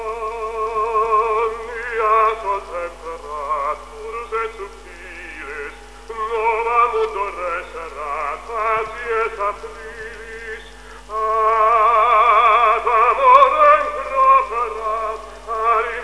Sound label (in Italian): basso